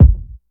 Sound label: nice kick drum sample F sharp key; kick drum samples